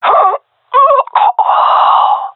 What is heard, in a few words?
death, gendarmerie male; vo; agents